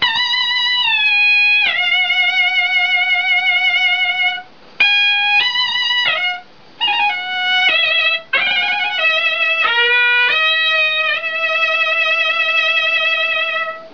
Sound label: suona